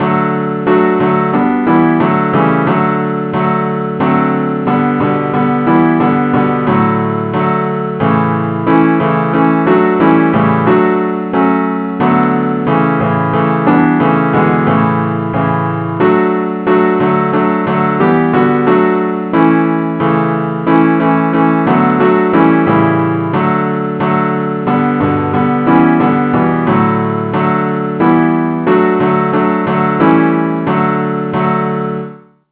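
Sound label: advance accompaniment key d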